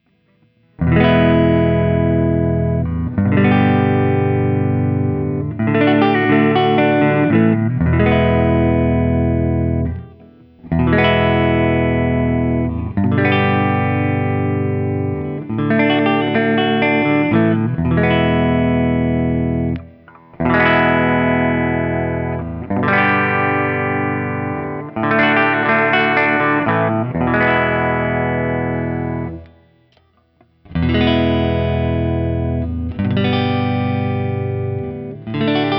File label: Guild X170T ODS 100 Open; Guild X170T Mars from 1999; Open Chords